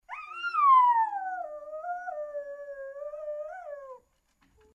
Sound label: wolf howl